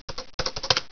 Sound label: typing shortest